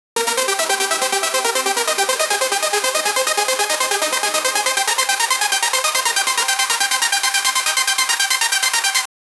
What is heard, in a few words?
fast beat edm mix psy